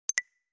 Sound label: buttons